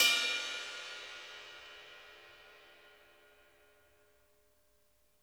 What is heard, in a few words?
bell, drum kits; Sonic Reality